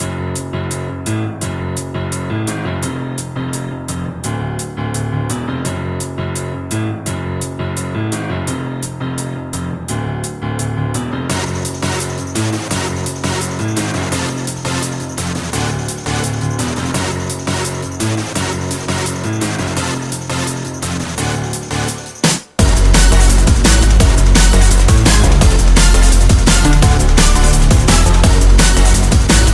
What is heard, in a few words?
Remix Instrumental